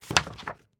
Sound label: X-Ray Film Place On; Medical; Blastwave